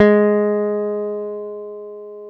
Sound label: G#2 JAZZ GTR; Univers Sons; Jazzistic